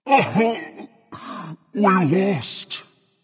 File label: boohoo berserker; cuchulainn